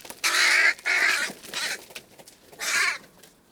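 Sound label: hooded crow corvus cornix cornix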